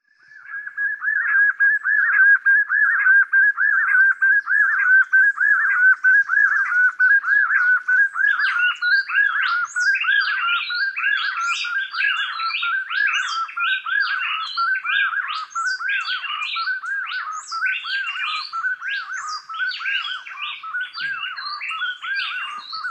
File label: Quail Sound